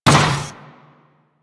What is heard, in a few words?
Moa Combined Step Default, footsteps; Enemies; Warframe